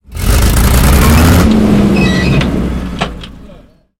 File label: panzer4 engine load, tanks; War Thunder